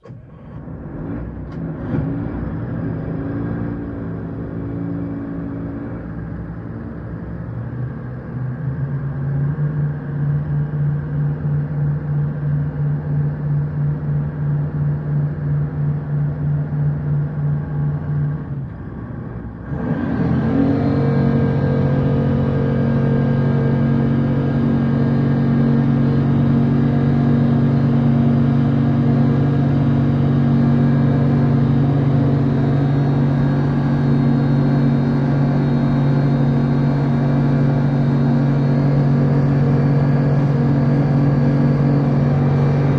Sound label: Military Pbr: Onboard Start-up, Slow Reverse And Full-speed Acceleration To Fast, Steady. Stereo